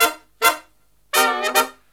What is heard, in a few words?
Brass Riffs; USB Soundscan vol.29; AKAI